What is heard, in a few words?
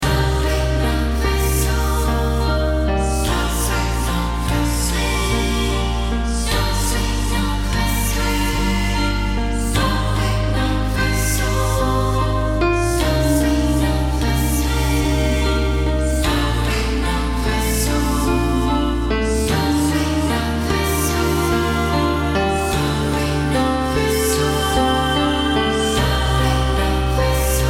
Healing Melody